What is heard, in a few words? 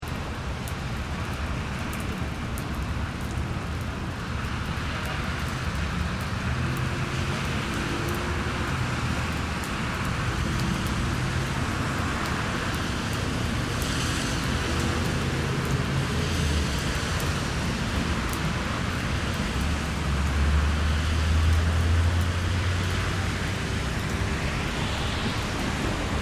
Wet Traffic Close Up Rain Long: Traffic on very wet; various cars and trucks by. Rain drops up close.; Traffic on very wet surface